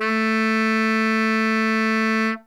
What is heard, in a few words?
rock type